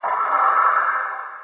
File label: inside battle soldier, prison; ambient; source engine; hl2; video games